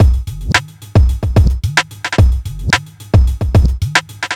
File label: r n b kit 110h